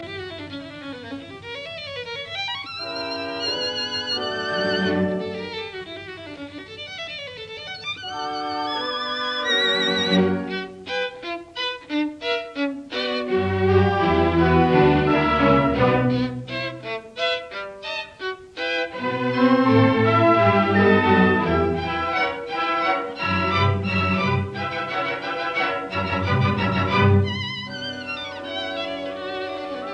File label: Violin Concerto in B minor, Allegro non troppo; Saint-Seans; Op 61